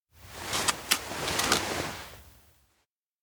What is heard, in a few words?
turn to; yomo; anemoi